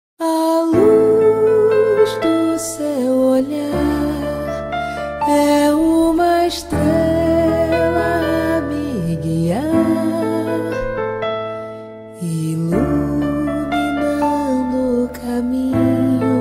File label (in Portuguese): valsa